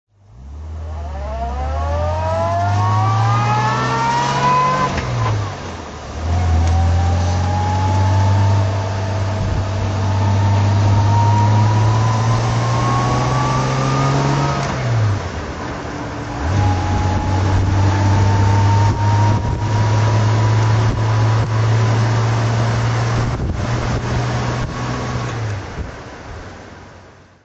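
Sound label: jaguar etype 38 drive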